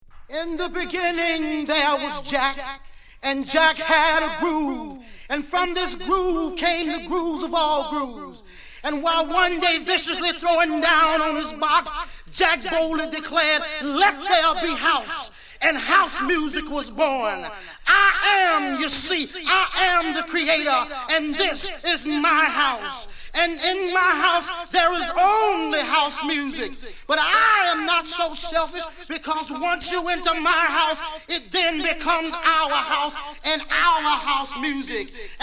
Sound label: Acapella